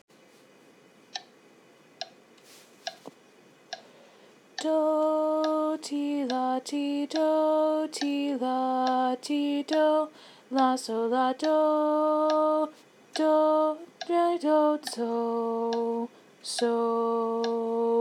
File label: alto